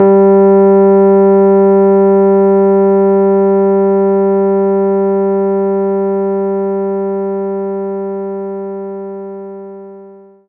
Rhodes G2; knights try

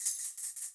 pop punk Speed Shaker Verse Pre Chorus; hot lava; ios macos windows; Molten

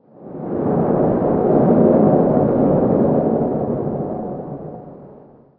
Ambient

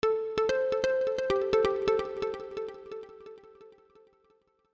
Key Fanfare; The Gem Collector ps4 wii u windows gamerip 2013

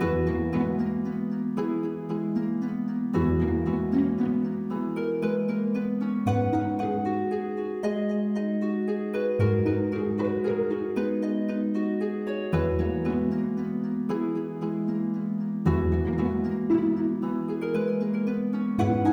City Upbeat (LOOP)

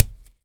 softball drop on dirt

